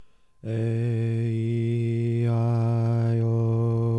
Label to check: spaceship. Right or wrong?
Wrong